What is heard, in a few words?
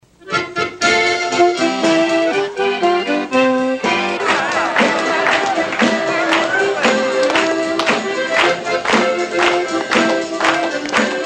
Jingle Bells